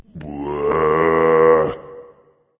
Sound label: tongue; smite; geb